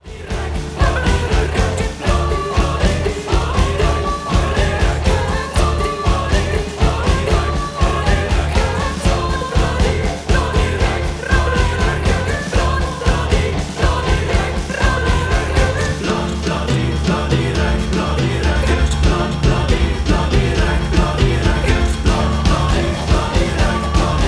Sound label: Bloody Racket